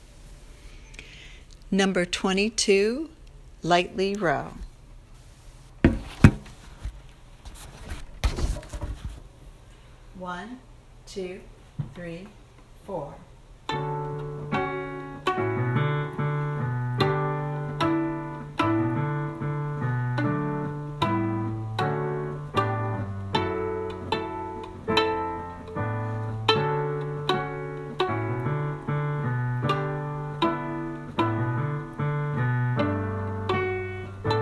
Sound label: violin viola